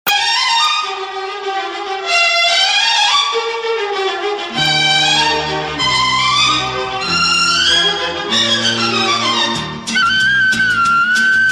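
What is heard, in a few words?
Tamil Instrument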